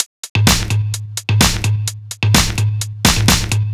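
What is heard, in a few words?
No bass drum, Drum Loops 128bpm; Zero-G; Soundclash Nu Dance Electro; Acidized Wavs; Jackin Box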